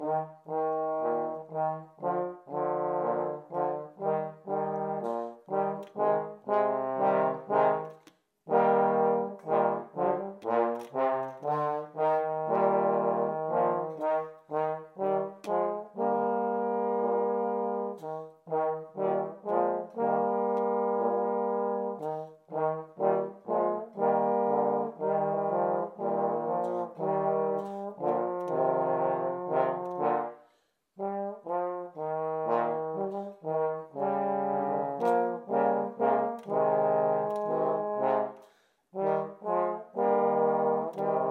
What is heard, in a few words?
trio w percussion; when the saints go marching in; toe excellence in chamber music